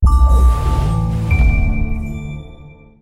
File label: Splash; Rabbids Go Phone Again; Ubi Splash